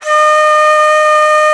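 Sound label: soprano bansuri, World Winds; E-MU Producer Series Vol. 7 – Old World Instruments; World Combos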